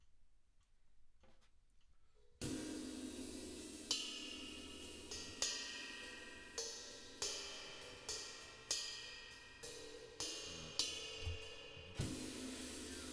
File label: Drums